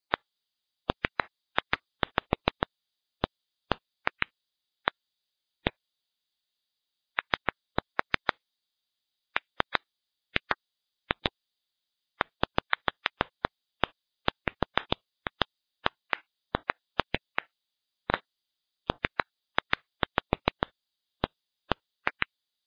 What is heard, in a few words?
plant sound, stressed plants make ultrasonic